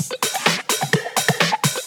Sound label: Housebeats, 128BPM; Vengeance Samples; VEH2 Loops